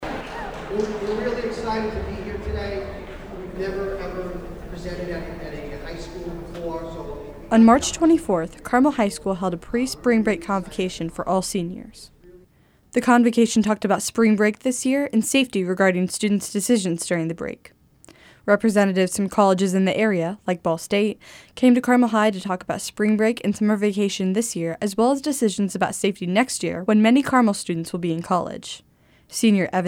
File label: BEAT